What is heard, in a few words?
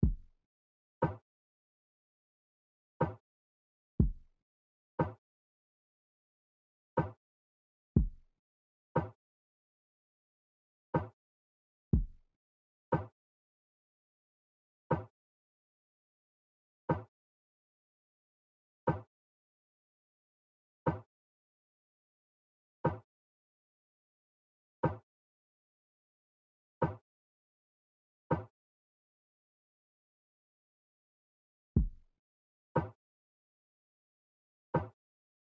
121 BPM C# Min Percussion; Loop Stems; Cymatics; Ashtray